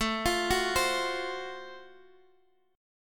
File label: Gb Minor Major 7th Flat 5th, chord